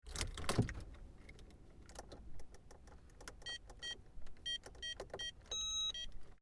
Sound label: car; spoteffects